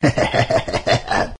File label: hehehehe